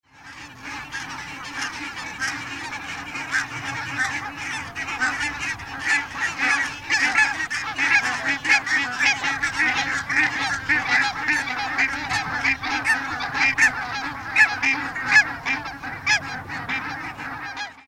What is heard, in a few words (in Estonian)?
valgepõsk lagle, Linnulaulumäng